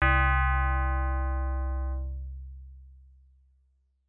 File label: COIN; vindicators arcade 1988